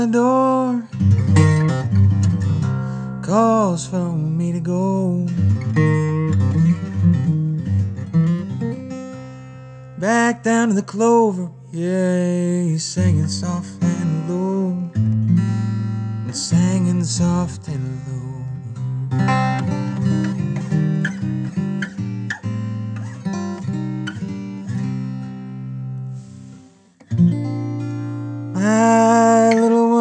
blues

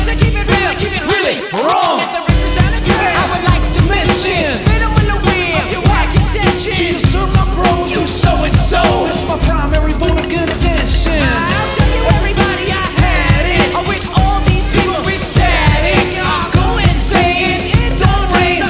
hiphop

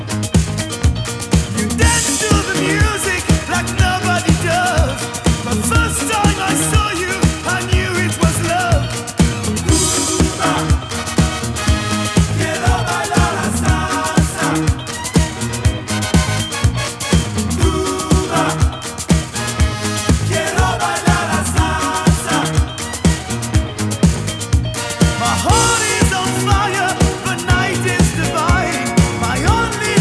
disco

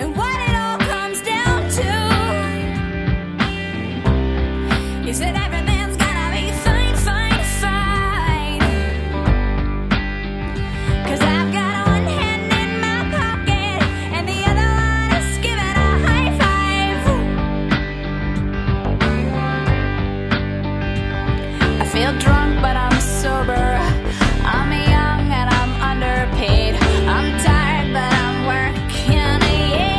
pop

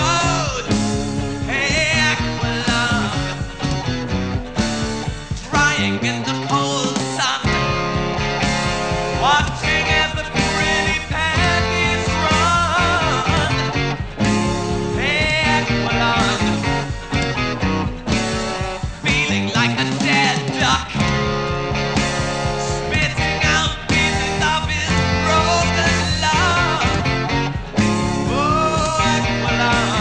rock

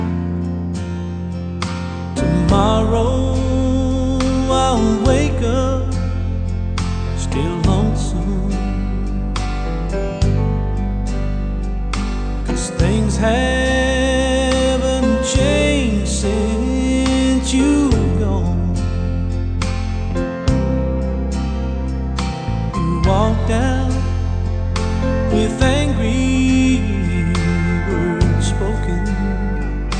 country

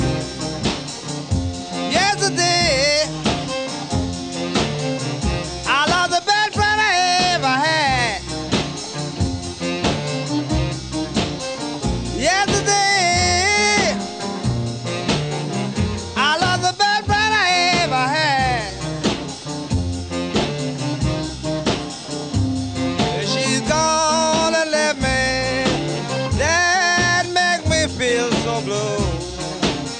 blues

